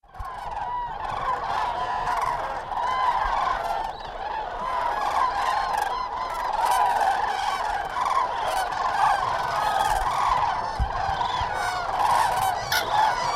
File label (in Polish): Poranek zurawi